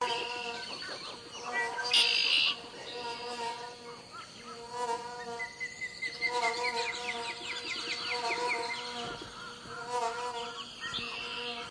hummingbird feeding; Zoo and Nature

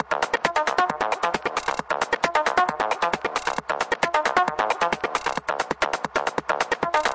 134 tweaky rolling acid no kick

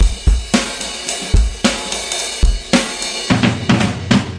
blanging ride to toms, 109 bpm, drum loop; 101 to 110 bpm

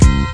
horn hit; drums single hits; Punches Hits Discoblasts

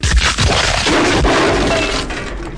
major damage; Weird and Interesting Hits; single hits

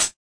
hat

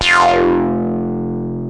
reso synth note

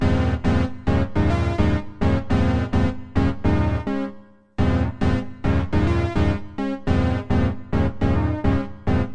105 e flat synth sevenths; KEYS ORGAN PIANO LOOPS

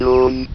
doom, VIDEO GAMES; gorf

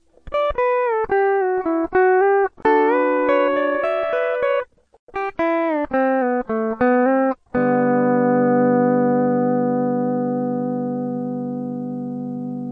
pedal steel guitar turnaround; GUITARS

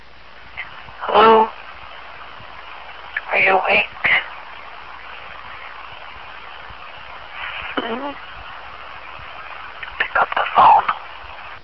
pick up the phone

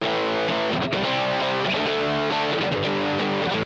bad guitar; GUITARS

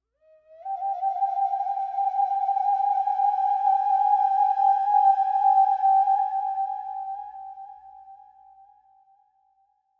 verby bamboo flute; Flutes

